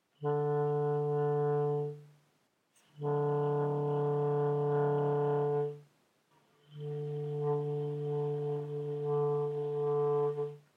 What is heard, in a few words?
normal low e tongue flutter throat flutter